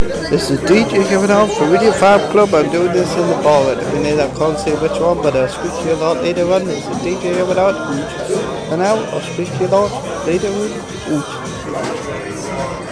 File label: pub